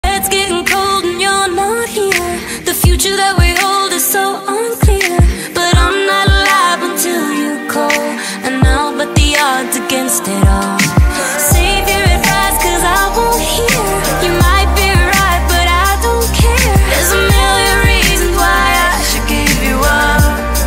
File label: ringtone